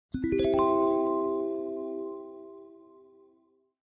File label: spin Standard Opening